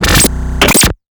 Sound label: Drill Bits; Plastic Surgery